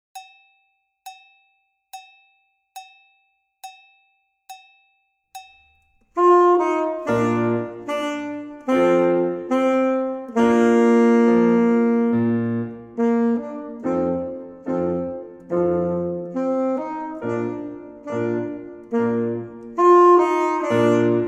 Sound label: cour du roi sax